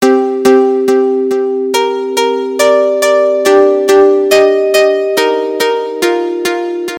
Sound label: Trance Pluck Melody